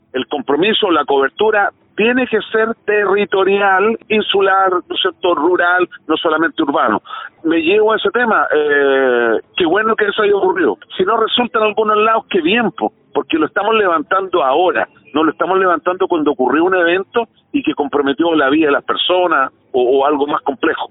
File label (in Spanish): alarma